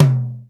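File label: tom, 60s kits; 90 sSampleCDs; Crypton - DrumBase5000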